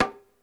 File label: PERC, ETHNIC DRUMS; Crypton - DrumBase5000